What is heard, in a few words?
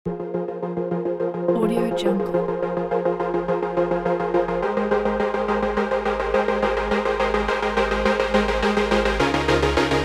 sad ambient electronic